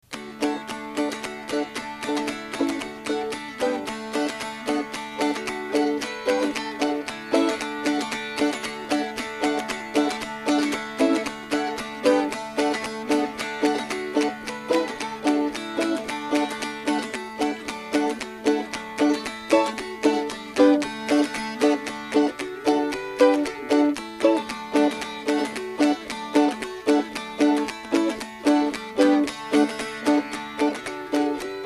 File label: Dulcimer